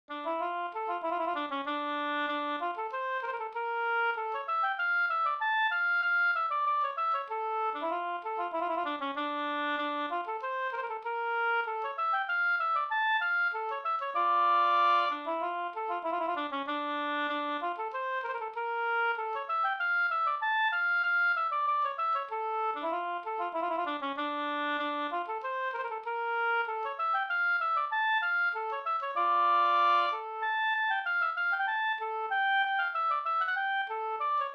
polska Bingsjopolska

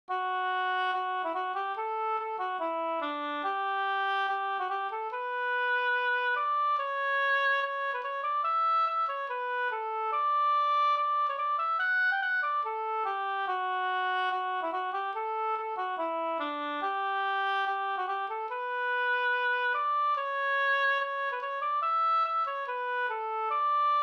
schottis; av Olle Wallman

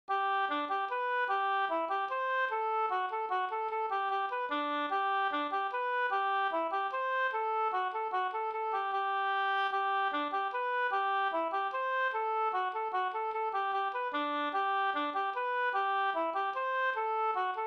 hambopolkett; Priffe i storstugan e Bror Andersson Sd

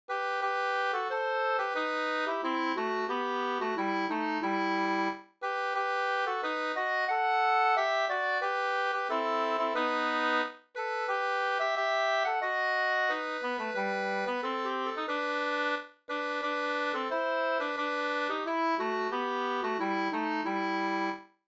vismelodi; Om dagen vid mitt arbete

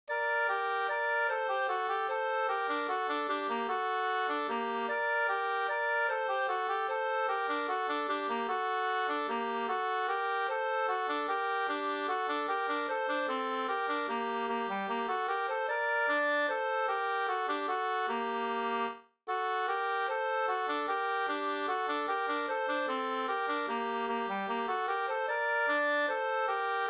langdans; Lilla vallpiga Afzelius 2st

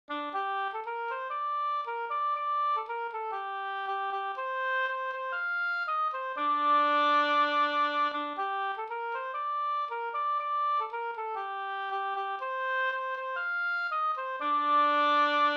vismelodi; Orsavisan; Gossa Anders

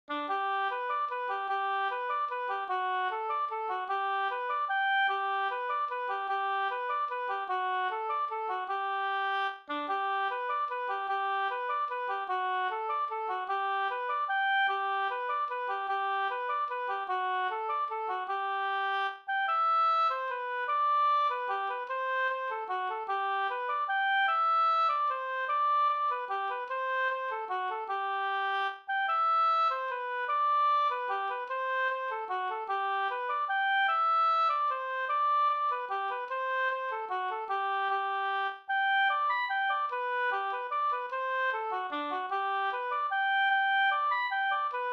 vals; Matts Ersson

